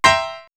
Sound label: House Piano Chord; X-Static Goldmine; backtothe70's